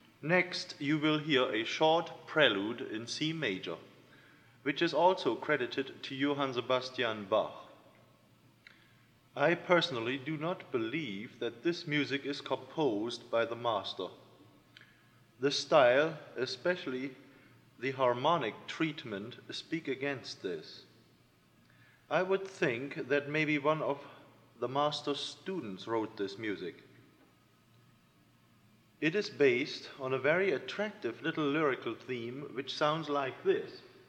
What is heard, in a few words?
Prelude in C Major Introduction; Bach; BWV 567